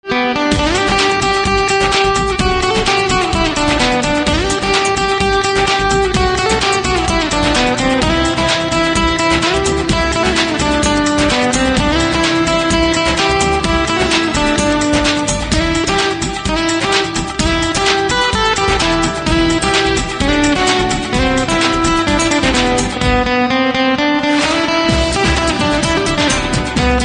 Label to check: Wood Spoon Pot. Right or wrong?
Wrong